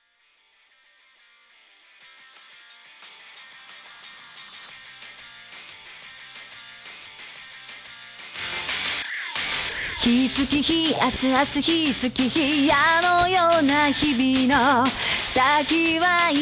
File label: Acapella TV Size; Yorinuki Gintama-san